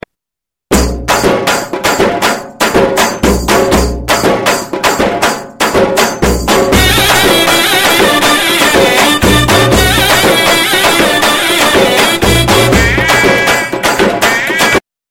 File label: New Tone